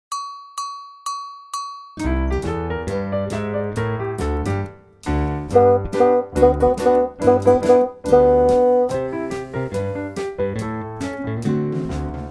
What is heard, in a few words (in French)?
basson